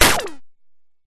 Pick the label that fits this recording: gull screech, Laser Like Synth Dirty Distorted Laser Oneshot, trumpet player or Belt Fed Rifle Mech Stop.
Laser Like Synth Dirty Distorted Laser Oneshot